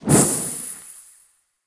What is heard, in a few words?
projectile; smite; baron samedi